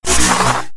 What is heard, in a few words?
unmorph; samus powersuit